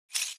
imp bullet lightballistic tile debris

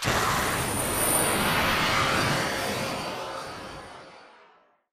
boost glide body; Titanfall 2

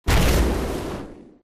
Jumpjet Jet Start Flame; Titanfall 2

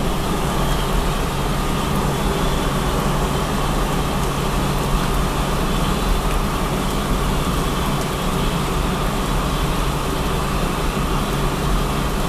industrial vent; boneyard; Titanfall 2

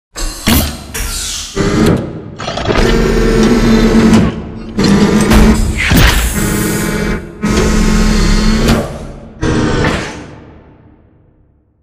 Assembly Wall Arm Close; Boomtown; Titanfall 2

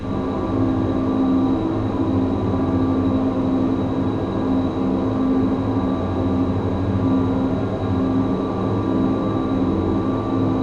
Fog Vent; Boomtown; Titanfall 2

